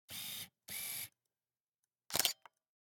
Camera, Pentax KS2, DSLR, electronic, mechanical, focus, out in, snapshot, quick; Eiravaein Works; Vaeyan IV